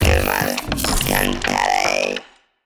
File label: lithoid machine; lithoid portrait; Stellaris